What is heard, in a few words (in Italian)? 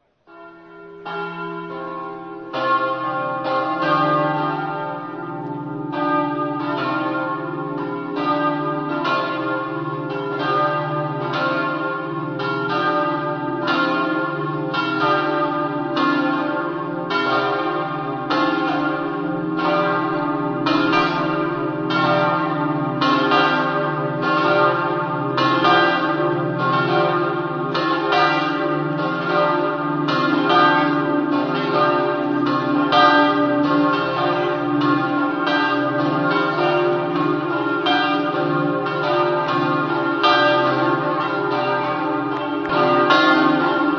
smaria, campane